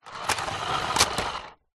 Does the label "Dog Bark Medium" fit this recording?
No